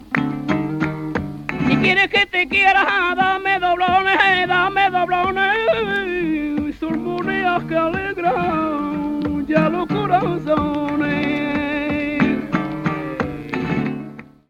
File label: tango, flamenco